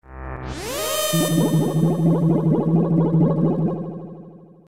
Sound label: zap aqua; ff3; ff3us